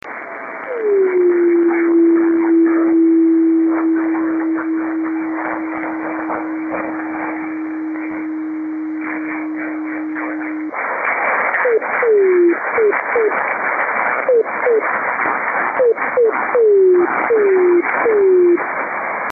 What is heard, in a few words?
26590KHz, driftnet beacons